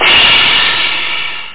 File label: cymbal; drums; nature